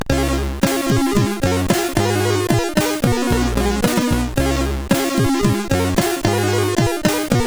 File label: Radio Jingle, CSG8580; CSG8580R5